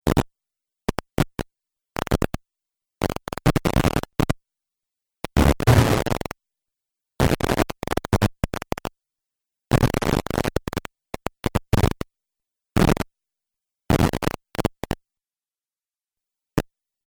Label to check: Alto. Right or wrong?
Wrong